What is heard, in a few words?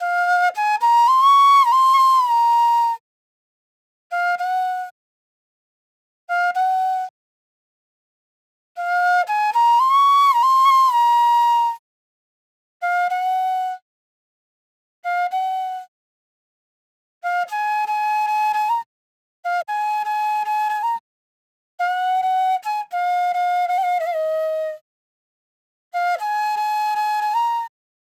90s Flute Loop 110 BPM A